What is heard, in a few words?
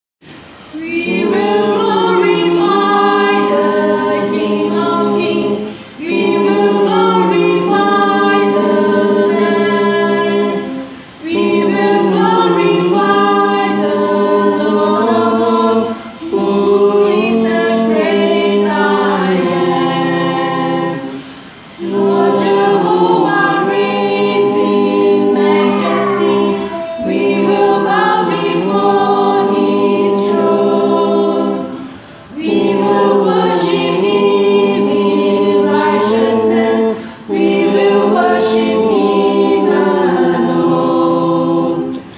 tenor